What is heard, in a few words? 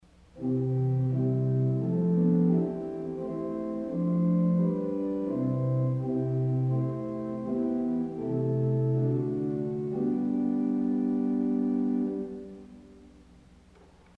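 Pedal Nachthorn 4 St Anne, organ stop; CulverAcademy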